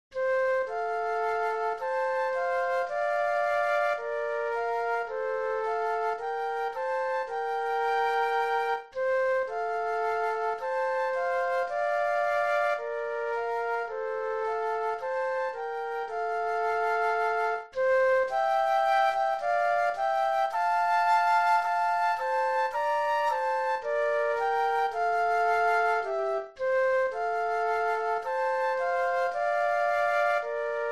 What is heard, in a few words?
Flute Duet